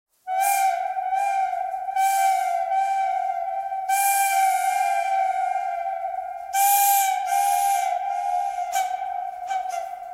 bird canyon thread buzz

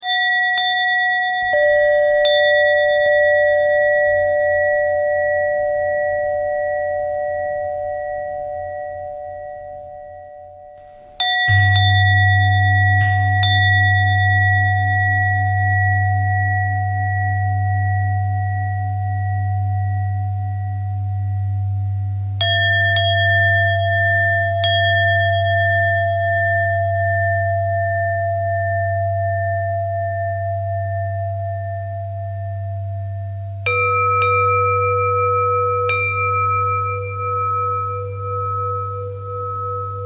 bell bend threads

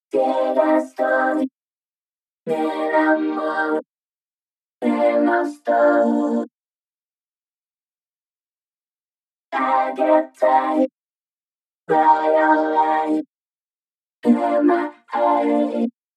Vocal Vocoder; Bitwig Studioにヴォコーダープラグインが追加

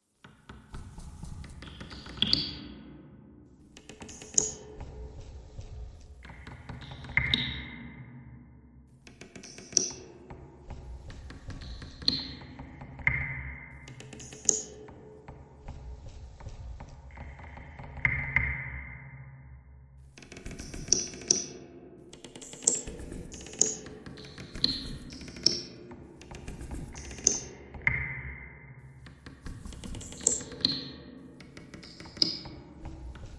bagpipe thread noise; reverb

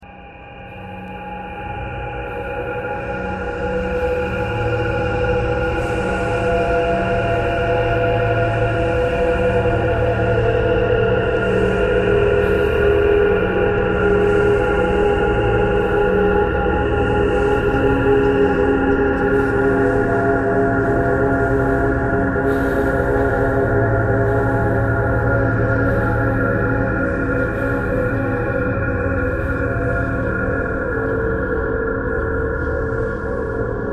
traffic core birds thread pentatonic

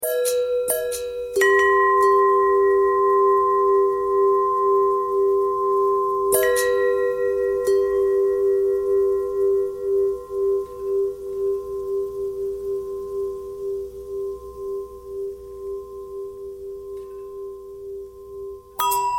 long bell bend threads

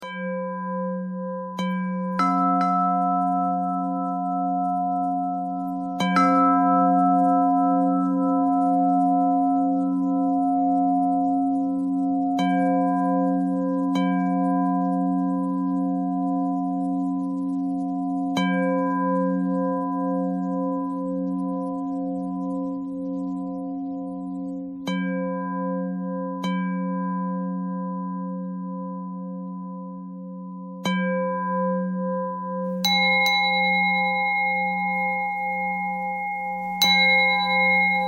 bell bell thread simple, bells

